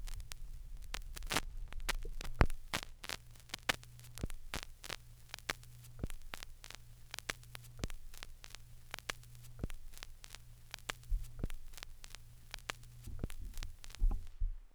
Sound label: Ambient Noise, record fuzzies; Micro Pack